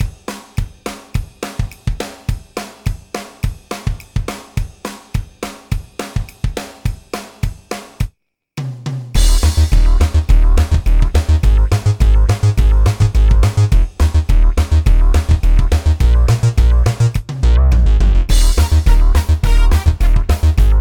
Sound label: music; basic games; Frog Crossing